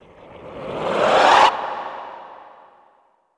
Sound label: SWELL FAST, Classical Choir; Best Service; Extended Classical Choir; SWELLS